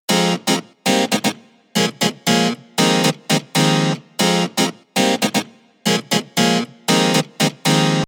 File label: harp